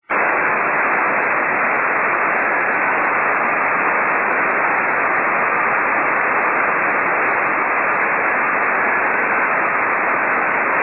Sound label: 5G jt65c; 弱雨、気温24℃ 湿度85%; ANT:45cm offset dish; WSJT JT65C; JH1UGF 横浜市; JN1AYV 茅ヶ崎市